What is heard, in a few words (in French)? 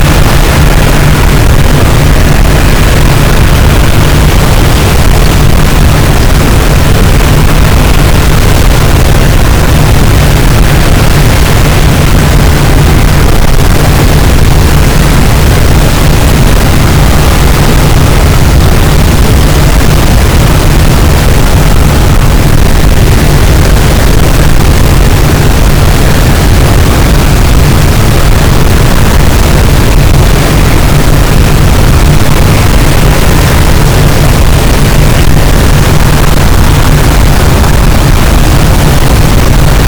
cachalot, boat; Physeter Macrocephalus